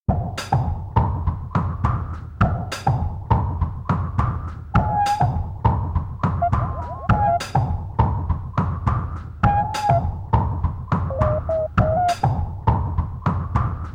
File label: organ pub; Escaflowne